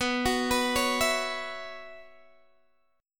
B Suspended 2nd Suspended 4th B Guitar Open D, chord